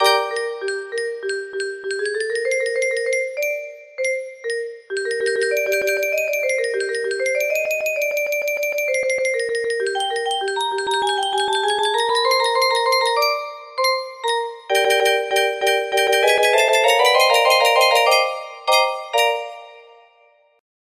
theme melody

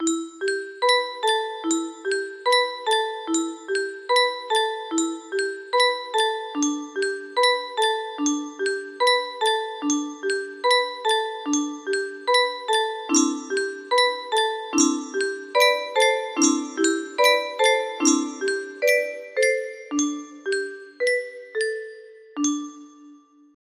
melody

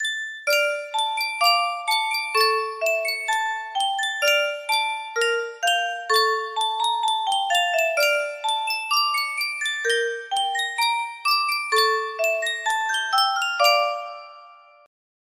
Yunsheng music box

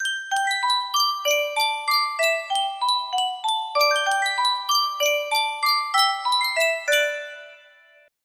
Sankyo miniature music box

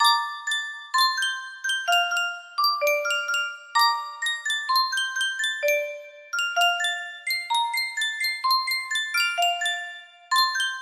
Sankyo music box, music box melody

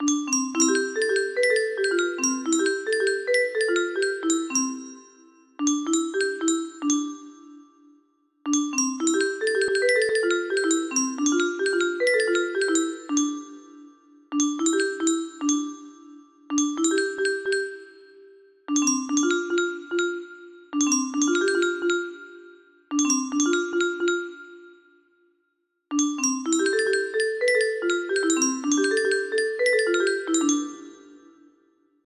music box, music box melody

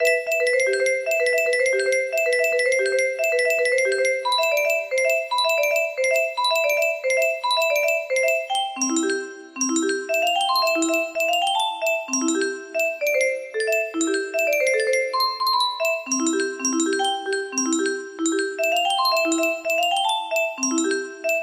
melody, music box

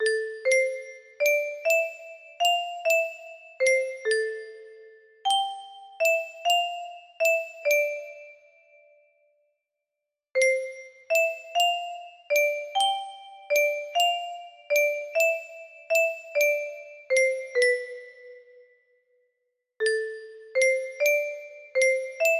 music box, music box melody

